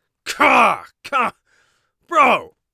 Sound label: angry, Warrior Voice; Warrior Voice Pack